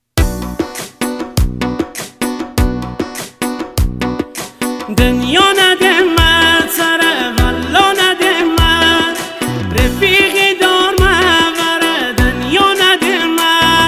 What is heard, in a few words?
rafigh ghamgin mazavdarani